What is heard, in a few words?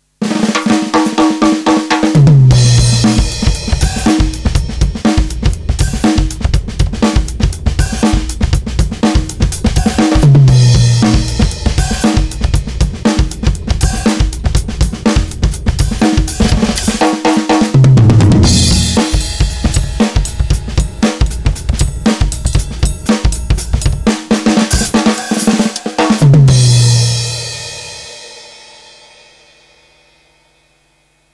Drum Sample Funk Beat